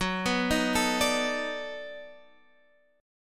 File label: B 7th Sharp 9th